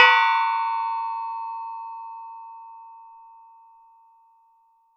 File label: Metal Teapot Stand Strike